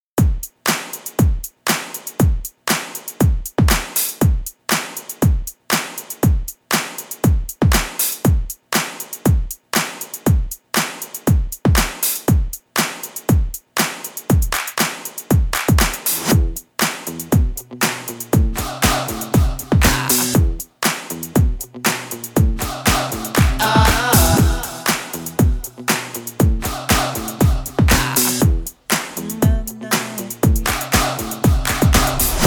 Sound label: bpm 119